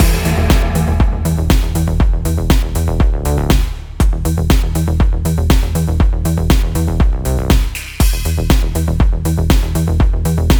music knife-action loop; Necrobarista Final Pour

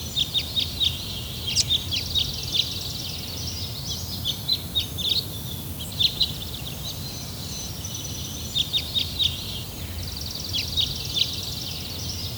Park Ambience

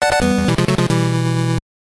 Player Lost; Isolated Warrior; NES

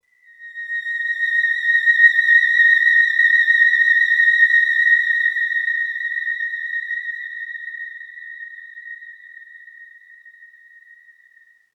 Oneshot Synth

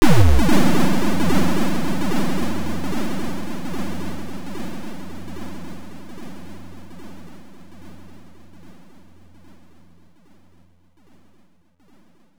Destroy; Sorcerian; MSX2; OPLL

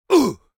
Male Fight Damage; Mighty Party; Android

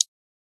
onselect

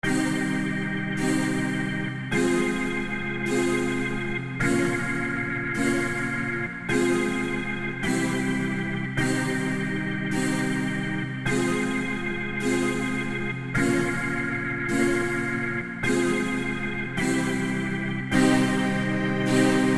dungeon; Hyperdimension Girl Quest; mitora

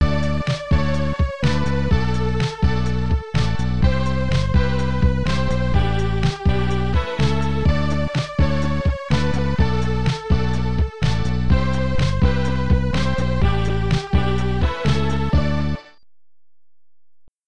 Level Complete; qix amiga

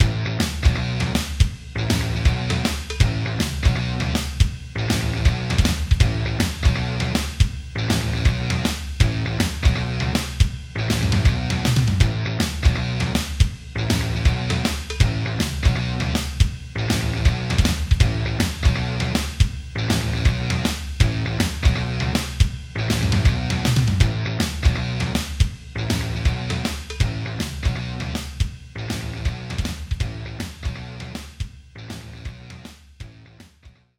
3d Music

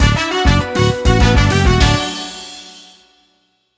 Mid Goal; Nickelodeon Kart Racers

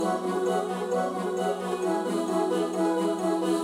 the cave music lilypad; fujii ps4 windows gamerip 2019

